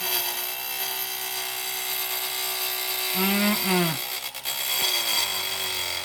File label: fan on fork action